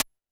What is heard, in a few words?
Roland TD-20 Percussion